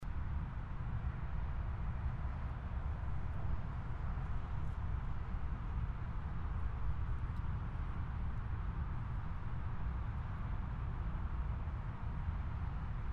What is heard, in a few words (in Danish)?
Motorvejsstøj optaget på midterpladsen i stillebænken